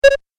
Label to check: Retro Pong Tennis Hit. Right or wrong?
Right